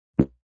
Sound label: Blip; Beeps; multimedia